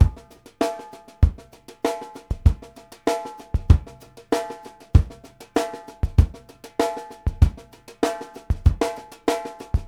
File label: STRAIGHT U L, New York City Drumworks, BR+STK 96; Sampleheads; New York City Drumworks VOL-1